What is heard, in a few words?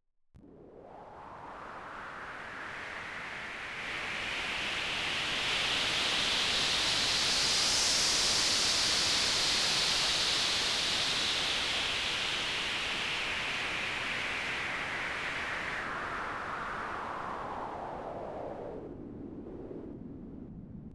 noise art; studiofil